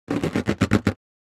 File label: Rubber balloon friction creaks